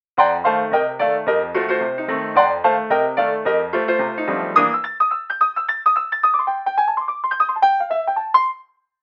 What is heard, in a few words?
Upright, Pianos; PC3; Ken Brns